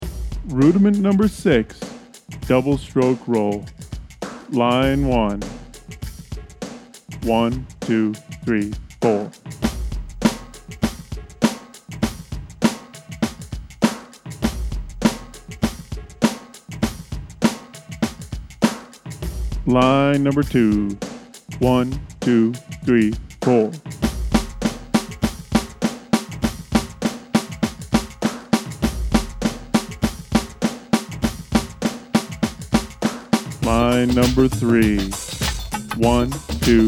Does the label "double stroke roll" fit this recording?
Yes